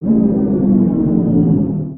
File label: Siege Tower; Distant; destructibles